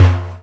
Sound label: Arabic percussion; ETHNIC and WORLD PERCUSSION; Arabic Percussion Kit 2